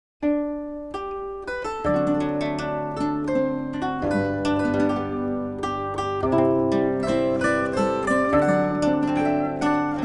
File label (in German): Volksmusik